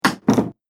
Door Closed; Door Closing Sound Effects

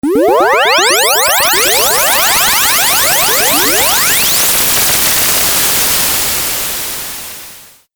Teleport Warp Video Game; Twistedtracks

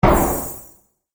Magic Hit Sound; Magic Sound Effects

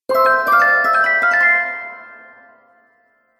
Fairy Flies Higher, magic

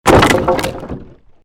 created wooden barrier smash medium

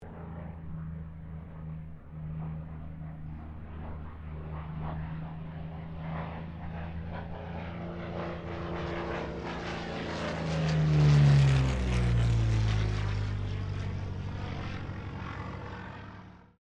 AIRCRAFT P-40 KITTYHAWK PASS STEREO

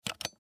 Car Cigarette Lighter Push In; Transportation Automobile; Door Closing

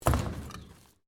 debris; Material components for constructing more complex sounds; Materials

